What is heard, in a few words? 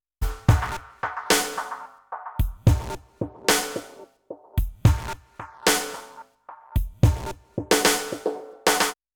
Loop Dry